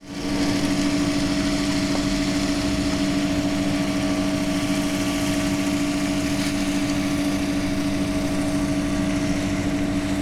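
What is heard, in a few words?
post office, Fukushima Soundscape; centralpostoffice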